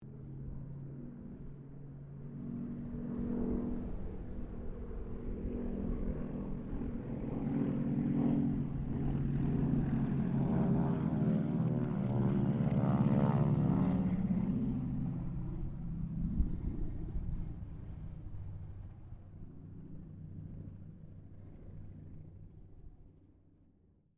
AIRCRAFT CT4 WINJEEL WIRRAWAY HARVARD PASS DISTANT, Mixed aircraft squadrons; Airplane; Vehicles; Aircraft Collection